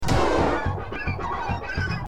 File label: stinky farting cat